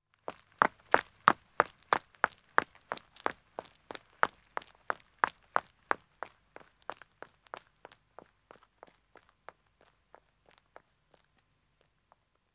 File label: Country Road Running man depa, Footsteps; The BBC Sound Effects Library